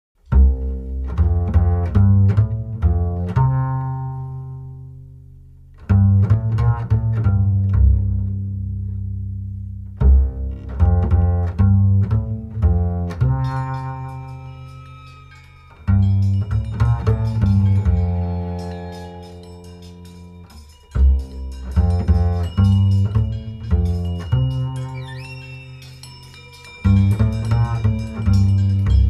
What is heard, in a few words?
Lullaby